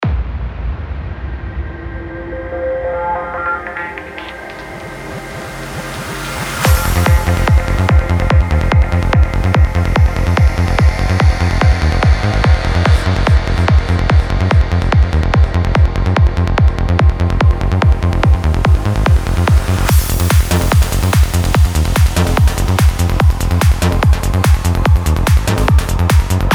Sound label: Psy Trance